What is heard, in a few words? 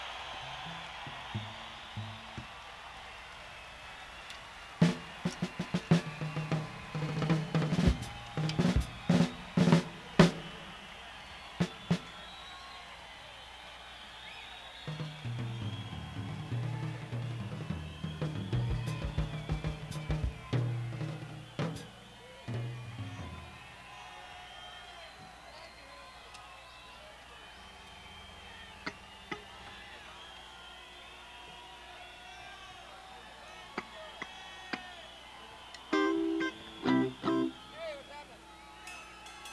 Tuning